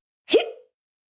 Hiccup High Hic, Hiccup, 1 child, short, human voice, kid; Hiccupping; VoiceBox